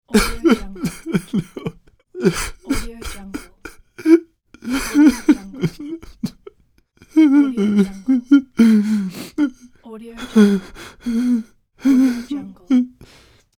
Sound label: man voice crying